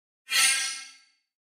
Pop Up Elect Whip Shim, pop up element

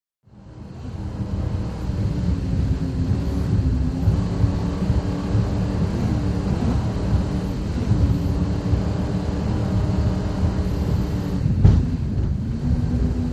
Power Boat Int Racin, off shore power boat

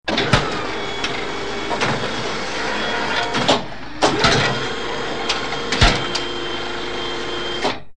Xray Machines Small

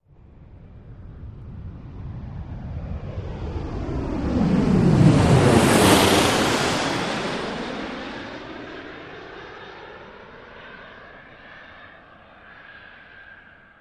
Jet By Reversed, overhead bys

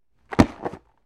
Football Catches

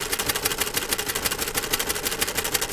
Simulated Teletype; typewriters

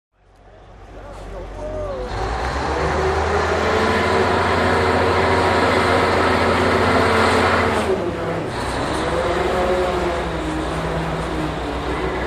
Hydroplane Dock cra

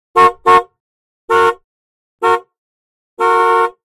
Horn Honk Mediumpitc

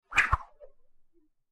Quick Single Wire Swi, swish, rope

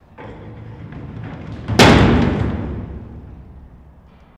Jail Door Roll Close; jail & prison doors

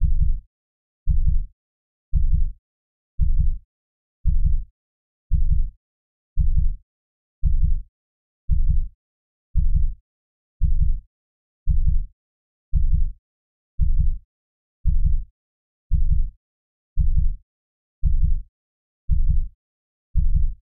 Heartbeat

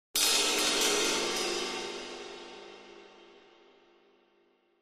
Cymbal Shrt Cacophon

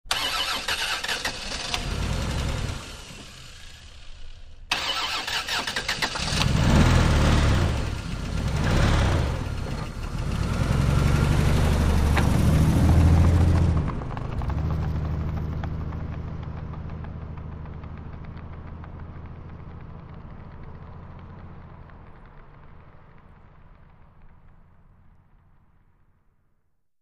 Car; Start, Away Medium Dragging Muffler

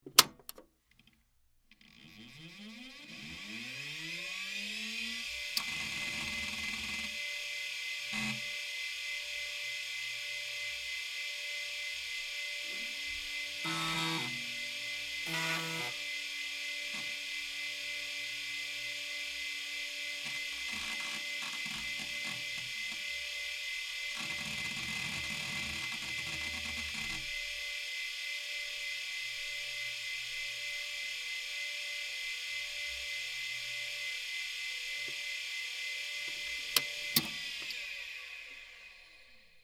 Pc Startup Hard Drive, hard drive whir

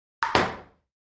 Ping Pong Ball Serve

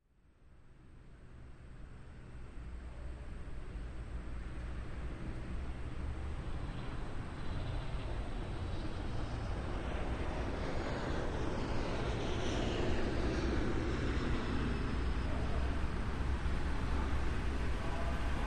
Distant Airport Lite, traffic

